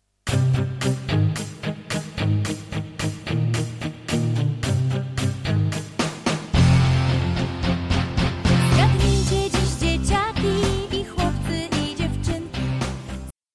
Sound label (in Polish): voc; Teczowa Muzyka